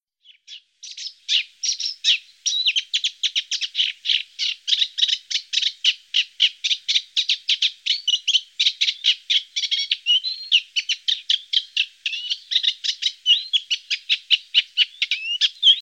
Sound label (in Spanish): carricero comun